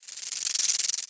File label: rattle warning layer, headcrab